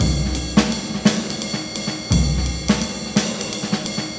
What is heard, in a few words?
Loop Mono, jazz pedalboard